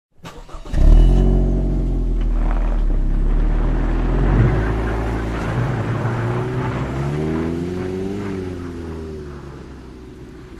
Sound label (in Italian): auto ambiente